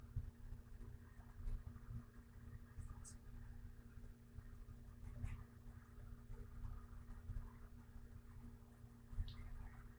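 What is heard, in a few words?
Laundry Room EVP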